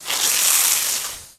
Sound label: Water Hose Spraying A Tree, Hard Start